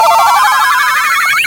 gate open; Puzzle

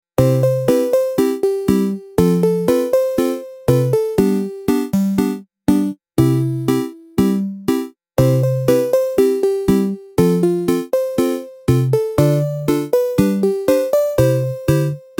shop theme, music; casual

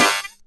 hit electric; Combat; combat impacts

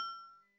anvil hammer; Crafting

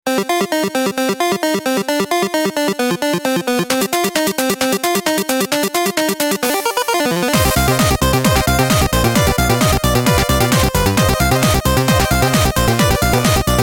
battle standard; freepixel

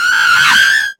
tire screech; Sports & Arcade; freepixel

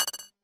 pressure plate; Puzzle; puzzle mechanics

